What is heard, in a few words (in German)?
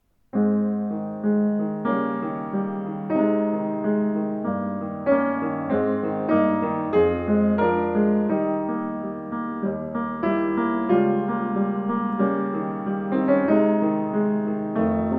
Steinway Z, Klavier